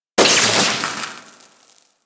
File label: Spark Shower; Fortuna; Warframe; Venus Open World; Venus Solaris United